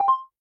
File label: Dial Tone; LOOPERS